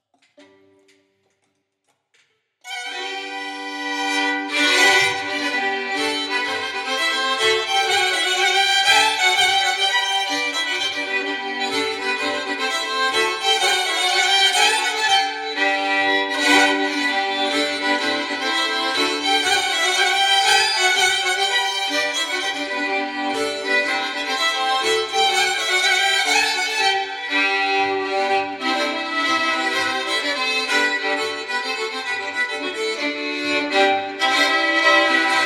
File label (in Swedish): Polska G dur; Johan Skar Svanskog